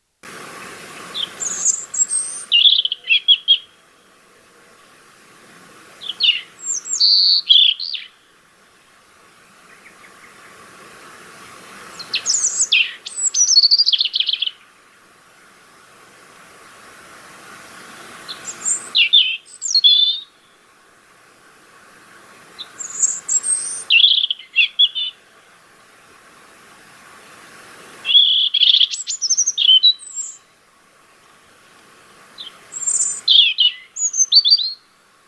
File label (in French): rouge gorge; Le quartier de la Torse héberge une faune importante et notamment une foultitude d’oiseaux